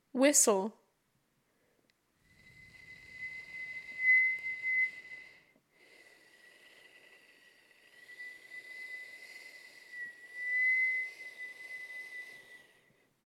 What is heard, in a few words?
Whistle; Whistle Tones